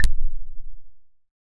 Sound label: ui button clicking sound; magical